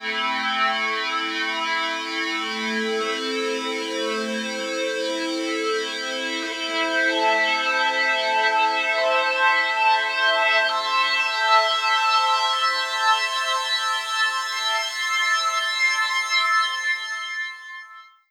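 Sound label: ascending A minor, analogue, ambiosis; Zero-G Ambiosis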